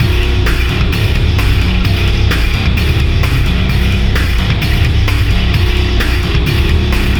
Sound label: drop loop